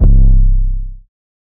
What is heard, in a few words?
808 C; Cymatics; Cobra Hip Hop Sample Pack